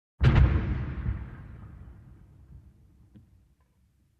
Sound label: Bomb explosion far off